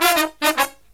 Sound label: Brass Riffs